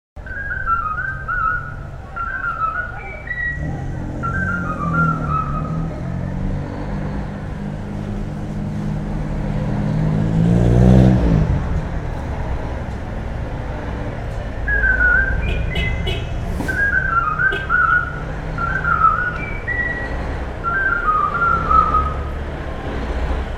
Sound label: Vocal